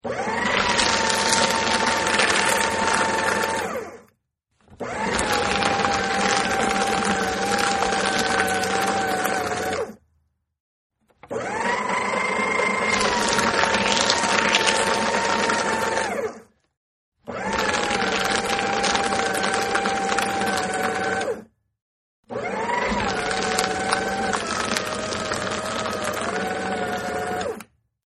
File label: Paper Shredder Active, Paper Shredder Shredding Paper; 5 Takes; Miscellaneous Office